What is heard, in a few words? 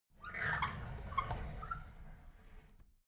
drawer big squeak open soft